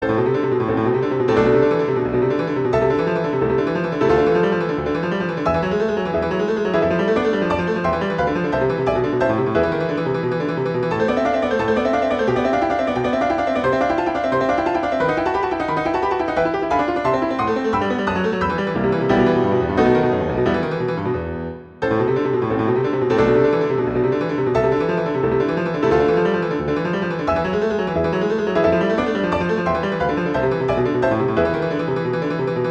Study 34 - Allegro molto vivo ed energico, Czerny Op 299